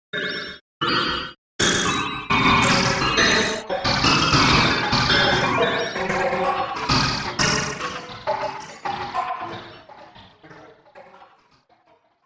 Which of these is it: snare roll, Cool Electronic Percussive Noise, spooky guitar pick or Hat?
Cool Electronic Percussive Noise